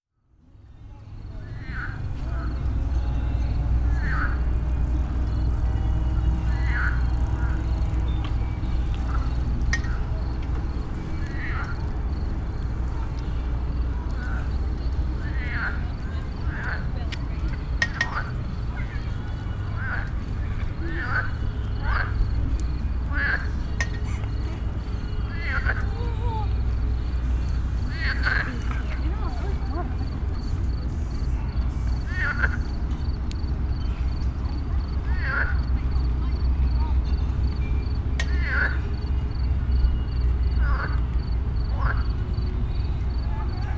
Frogs in Danube Belgrade Serbia

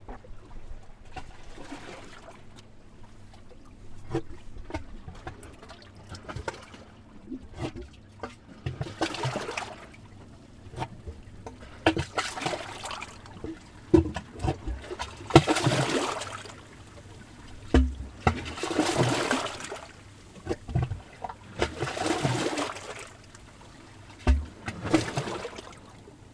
Blow Hole Sunset Beach Vancouver Canada

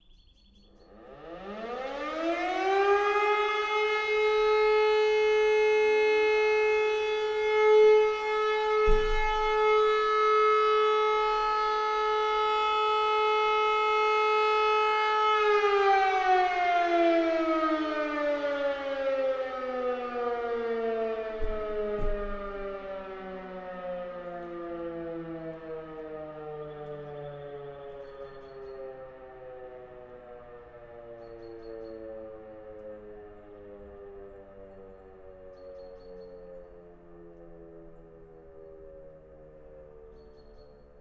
Siren Linz Austria

